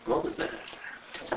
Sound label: burped